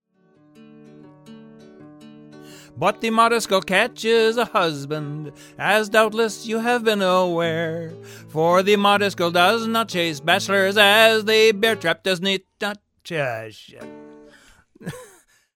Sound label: Vocal Fig 8; recording separated voice guitar same time